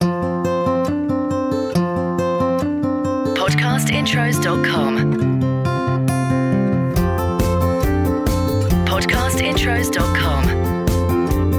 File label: ACOUSTIC Blossom; Podcast Watermark